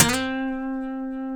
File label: D, funk guitars; Spectrasonics; Hans Zimmer Guitars VOL-1; TAKA FUNK